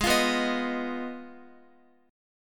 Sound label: G sharp Minor 6th G sharp down Guitar; G#m6 strummed; JGuitar